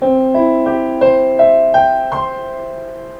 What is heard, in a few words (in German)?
Piano Arpaggio C Dur